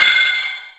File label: reverb crash sound c key